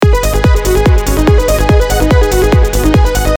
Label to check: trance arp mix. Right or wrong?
Right